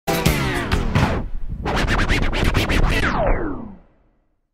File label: Music Stop